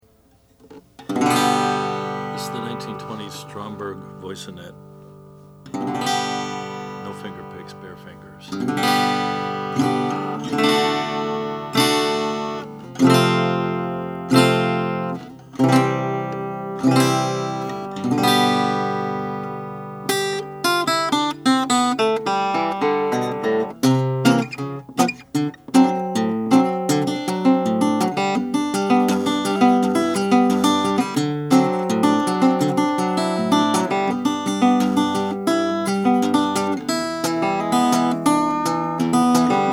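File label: stromberg voisinet decalcomania red burst; 1928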